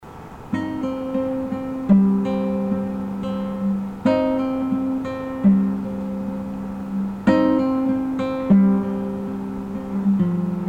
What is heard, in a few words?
Guitar